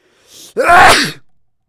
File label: Funny Distorted Sneeze